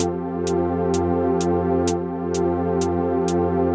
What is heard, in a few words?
pad